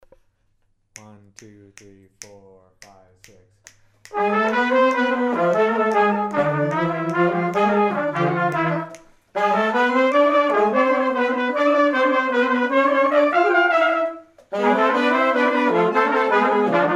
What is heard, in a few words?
melody A slow, rehearsal